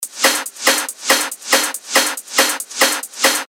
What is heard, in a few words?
140 BPM, techno loops; Bunker; Revealed Techno Top Loops Vol. 9